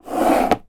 household plastic container case small slide across table